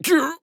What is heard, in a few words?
death; Paladins; Astro